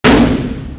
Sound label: brick; trolley; CosmicRage